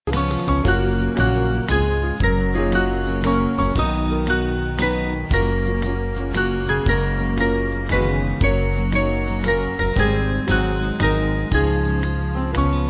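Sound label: Band, Music Backing Files for Churches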